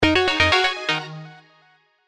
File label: Transide Calendar, House; HTC; House 1